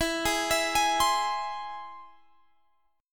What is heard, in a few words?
E Augmented, Guitar, Standard; E+ arpeggiated; JGuitar